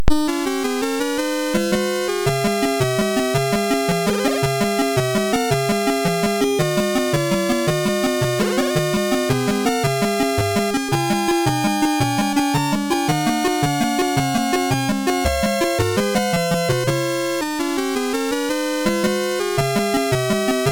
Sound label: Water song sid MOS6581R3; HVSC